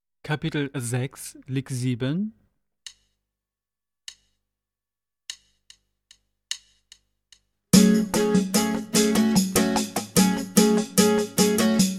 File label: Latin Style Guitar